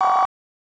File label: Sine Wave